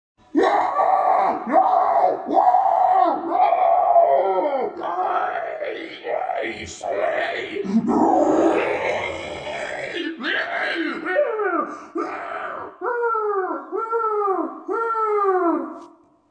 scream; pickles exe

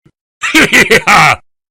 hehee heheheheheh haw

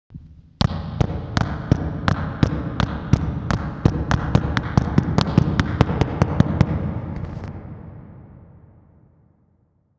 foghorn foot steps

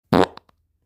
poo fart

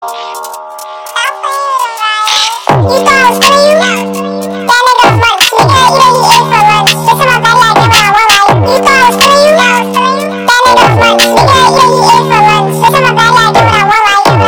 munch pitched up

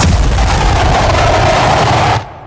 death monster

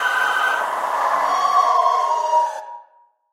parabolic banshee scream

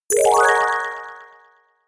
correct choice gliss

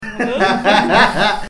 jajajaja

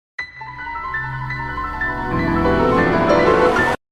scary piano

